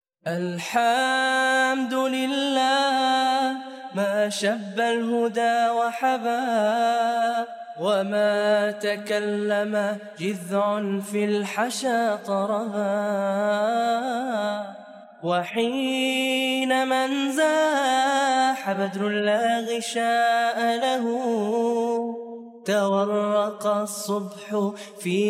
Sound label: Vocals Only